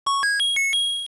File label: Key Appear, A key appearing; LOZ